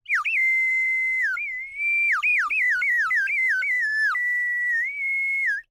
Bird whistle, clay, painted, water, airy, wispy, phrase, sustains, dips, negotiating, water plops; Eiravaein Works; Vaeyan IV